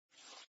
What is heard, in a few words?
slide, backgammon